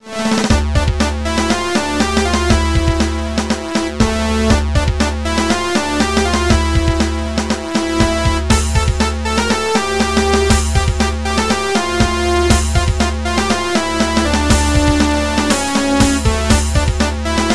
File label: Death March